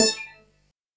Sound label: Industrial 140